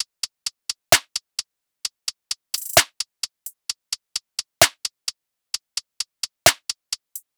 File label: Top Drum Loop 130 BPM; Cymatics